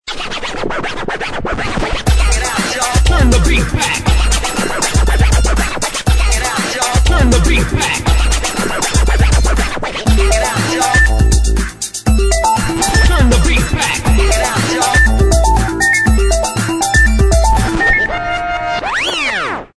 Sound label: Rap N Scratch, phonetones; OT-660